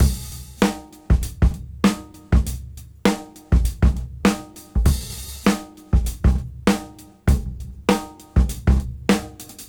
Swing 98 BPM; AMG; Keith LeBlanc Phat Butt Jams

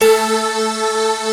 MULTI SYNTH

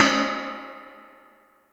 ride, Yamaha DTXpress Effect; Effect 1

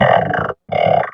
VOX Electro Vox; Sound & Vision; Gigapack II CD 2 (Roland)

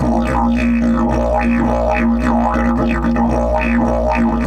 108, Didgeridoo

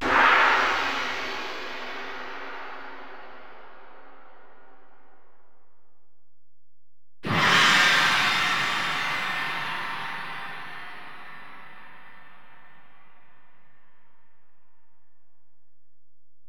Alesis DM-PRO Ride

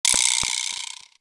Perc; Coldcut's Kleptomania VOL1

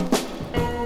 Band Fill 139 B, Lounge Lo Fi Break Fillz

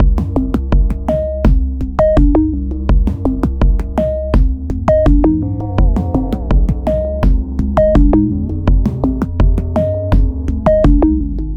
Synthetix, Processed Percussion

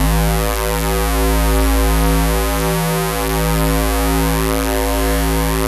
SAW, Synth and Organ

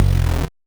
Noise; musicradar; 8-bit bonanza

